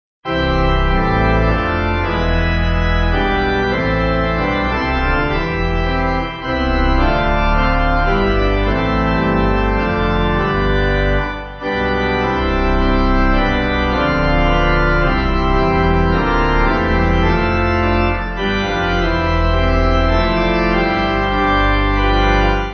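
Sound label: Pipe, hymn backing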